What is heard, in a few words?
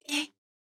Death; paladins; BombKing